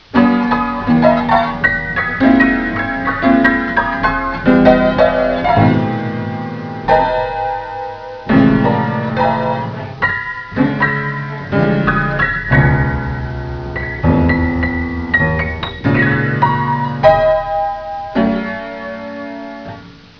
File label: zombie piano; ambience; svencoop